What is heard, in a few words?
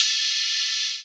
Ride, Cymbals